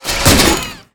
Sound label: Mine Die; Seer; GamigoNA